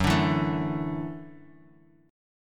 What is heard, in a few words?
F sharp Minor 7th Sharp 5th F sharp, Guitar Open D